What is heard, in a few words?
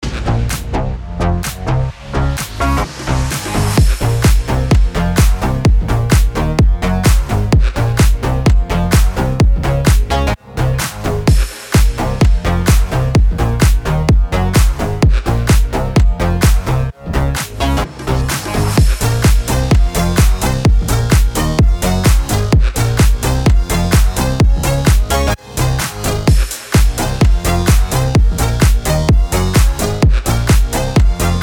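BED FUTURE HOUSE A# minor 128BPM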